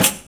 Pandeiro; Roland BK-5; Percussion

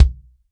Real Drum Kit, FUNK KIT; Best Service ProSamples vol.43

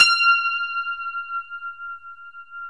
C5, PNO; Northstar - New Gold VOL-1; PLATINUM PNO

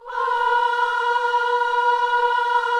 KIDS CHOIR, Gospel; Spectrasonics; Vocal Planet

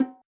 Bongo L; Yamaha DJX-2B

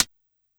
G#2 Analog HH Closed, Dance Kit

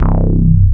BASS, Drum & Bass, 160 BPM

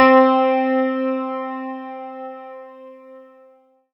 C4, Synth Line; NiceBeats

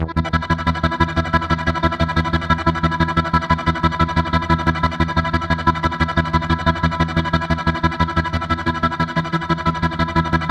C3, SynthLine; 90 s Sample CDs; NiceBeats; SynthLine VOL-7

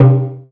MAL PERC, MALAISIAN; USB Soundscan vol.60; Asian Traditions; AKAI

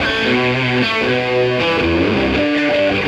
Electric Guitar, Big Rok; Sonic Foundry (Sony Creative Software) - Friendly Fire

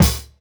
Kick, jungle breaks drum sounds

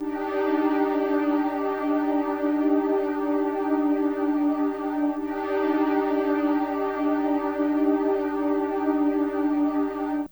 STR, Hip Hop and R&B; Best Service ProSamples vol.02; AKAI

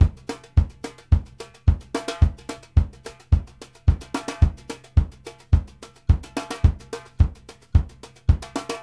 BEAT A, BRUSH 108; Sampleheads - New York City Drumworks VOL-1

